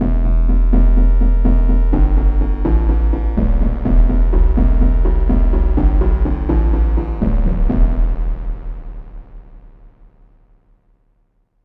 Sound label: crystal bass